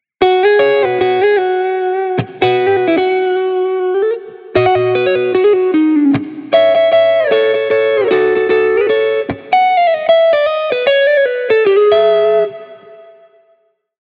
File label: guitar pattern 2 gt